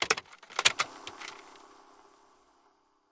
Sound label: Start Game; Game rip; MiSide